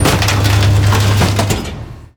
industrial garbage truck; soundmorph

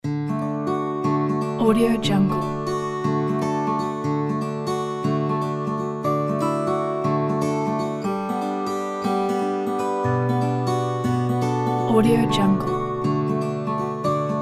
ambient music kit

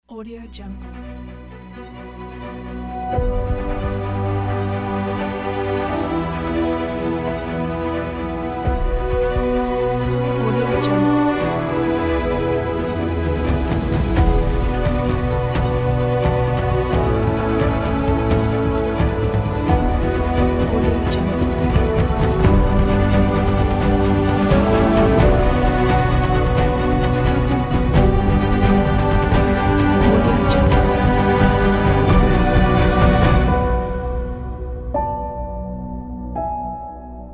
inspiring motivation cinematic trailer

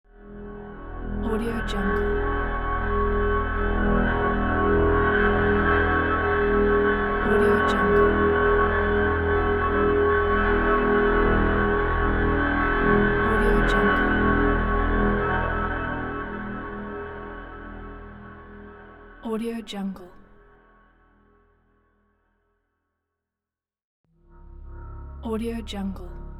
sci fi atmosphere

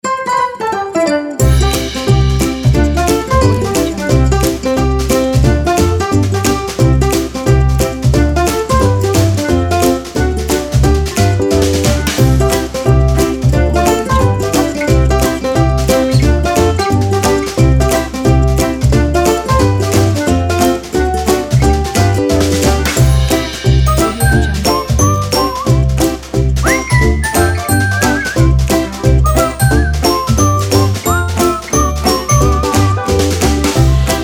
happy

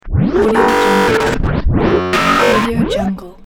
robo glitch

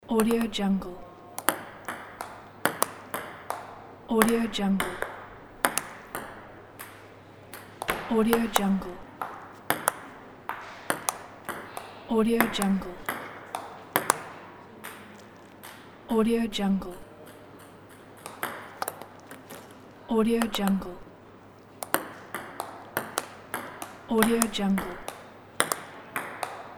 ping pong

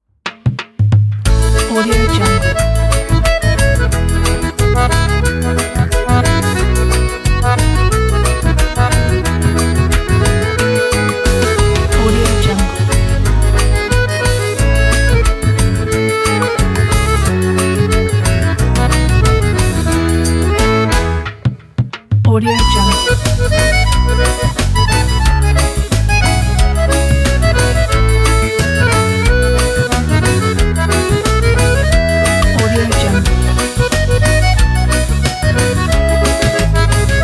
xote; flaviocortizo

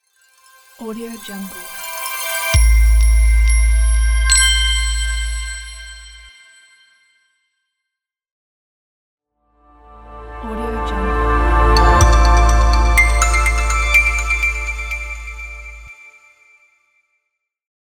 ambient logo sting pack

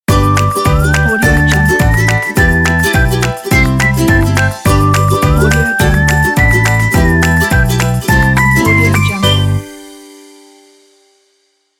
be happy logo

